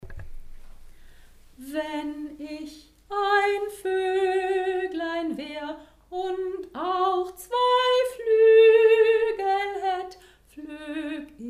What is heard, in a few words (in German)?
Voeglein Kanon